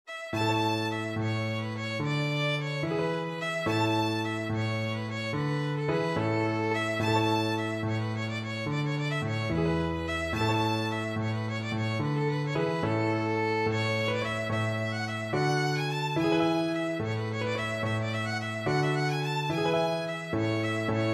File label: wilsons wilde violin